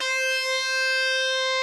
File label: table saw, Synths; Notes